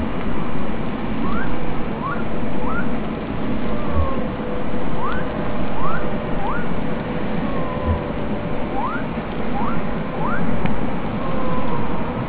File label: humpback whale